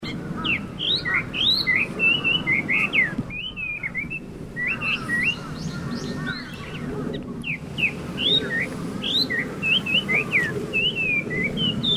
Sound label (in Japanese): ガビチョウ